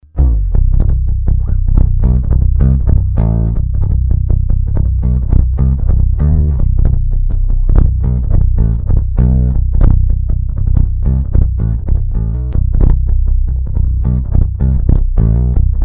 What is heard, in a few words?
Yamaha TBRX Active Boost Bass 3 PU Boost Mid 3 PU Boost High 3 PU